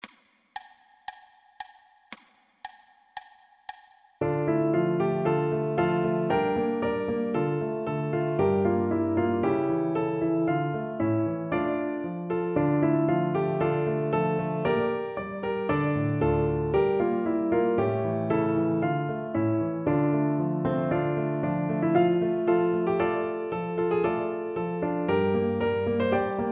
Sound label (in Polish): orkiestra marsz akompaniament; orkiestra koncertu niepodleglosci